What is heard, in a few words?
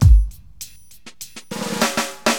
Drums, AcidJazz Funk